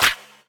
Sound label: Claps & Snares